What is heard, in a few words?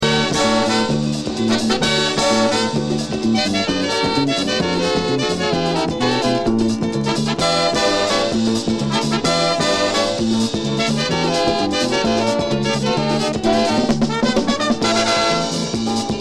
Pan In Harmony